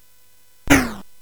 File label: Dark Castle, CSG8580R5